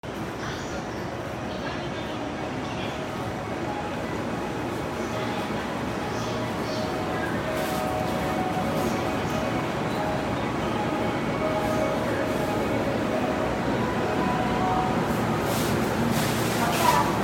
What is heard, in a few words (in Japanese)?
南武線溝ノ口東, train sounds